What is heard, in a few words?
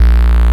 buzzer, Synths; Notes